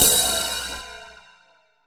PROCYMB, CYMBALS

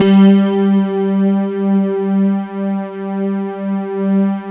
SPECTRA G3; Greytsounds VOL-1; WS SPECTRA

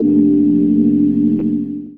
Chords Tones; Equipped Music; Loungin House

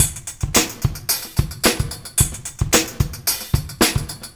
Street Tip, R&B Drums

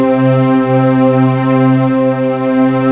PAD Oct Strng; Best Service Gigapack 1

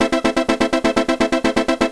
Techno Tick Min; Hip-Hop Street Beats Construction Kit; NYDancePRO

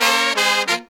Horns 132BPM; Zero-G; Phantom Horns

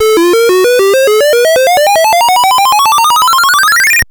Siren; Graz

